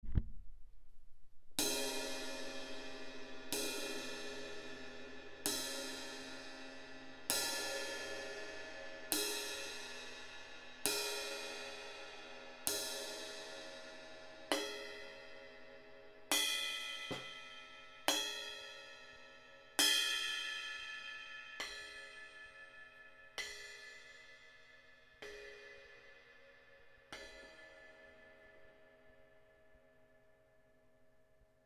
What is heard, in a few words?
Classic Crash Medium 17; Amedia